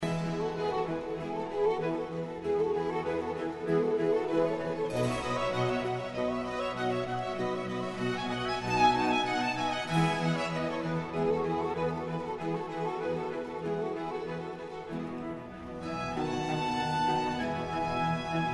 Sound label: concerto violin string; vivaldi; op8 no1 mvt1